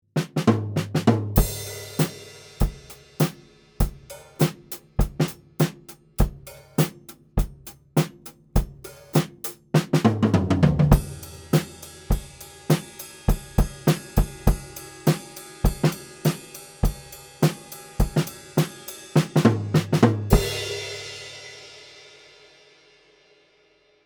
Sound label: DPA 4060 Drum Room